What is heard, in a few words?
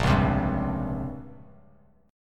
A sharp Minor 6th Add 9th, Bass Standard; strummed; 6,4,10,0